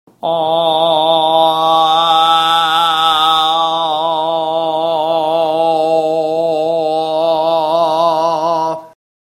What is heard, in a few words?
Aaaah; musical tone perceived dissonance